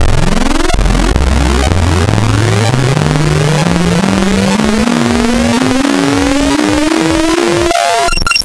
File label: Modulo Saw, wavetables; Architecture 32